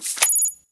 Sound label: cardkey pickup